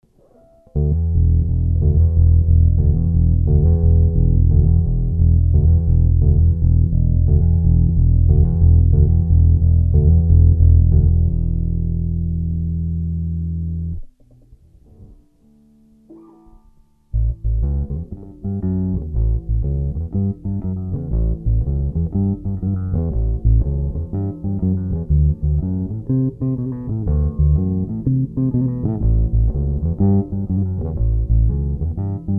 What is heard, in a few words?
stagg test, guitar